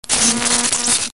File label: pain; hunter